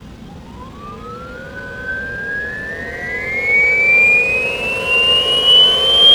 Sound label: runup slotting sinusoidal fast